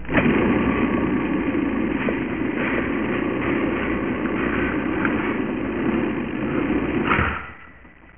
portcullis

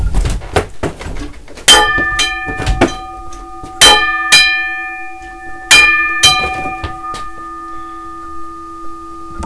well bell

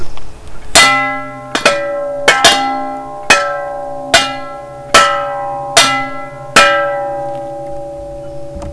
castle bells

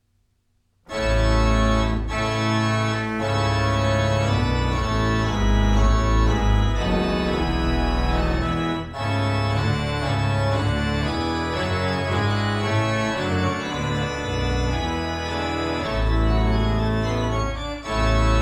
rigoudon, organ

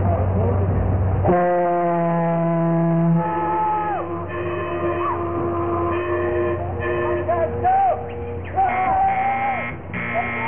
castle attack

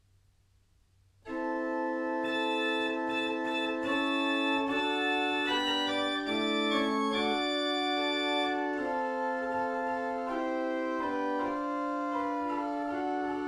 great variations, organ

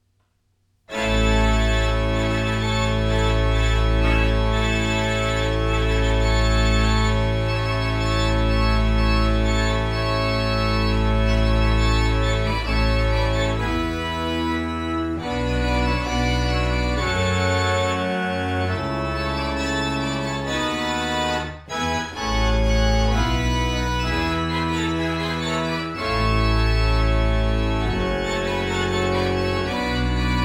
fanfare, organ

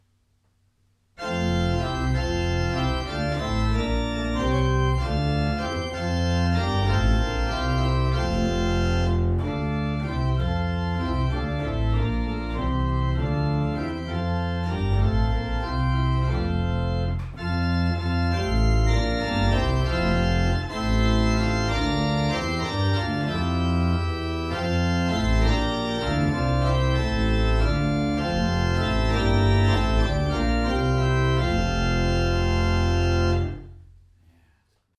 great chorale, organ

